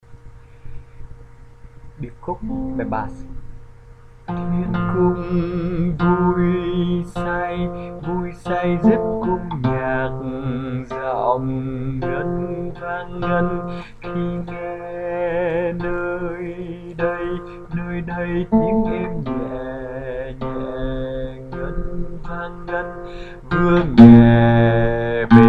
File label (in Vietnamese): Hội Nhạc Thiên Quốc, Bass